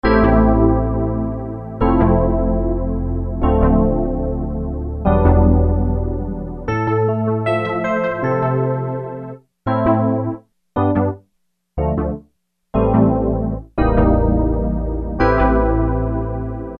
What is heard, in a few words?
Slap Back Pad, Roland MKS-70